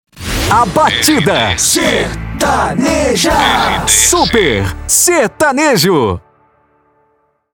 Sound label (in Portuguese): PONTE SUPER SERTANEJO